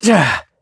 Vox Landing; Siegfried; kingsraid

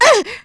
Vox Damage; kingsraid; voices heroes; en

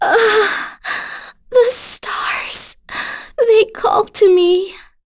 Vox Dead; Yuria; kingsraid

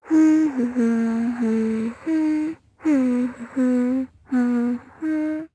Hum, jp; Sonia; kingsraid

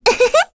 Vox Laugh; Rehartna; kingsraid

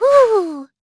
Vox blow; Estelle; kingsraid

